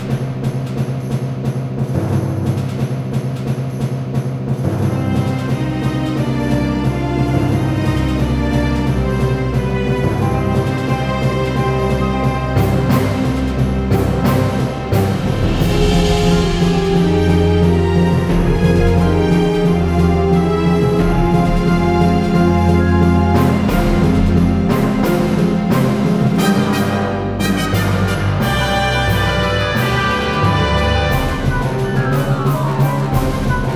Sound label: Action; Voidspire Tactics